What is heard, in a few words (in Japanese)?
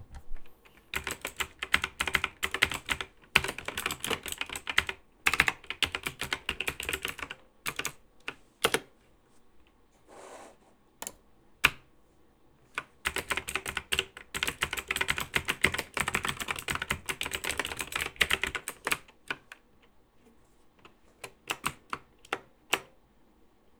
パソコンで作業